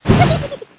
fire spirit deploy

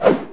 chute

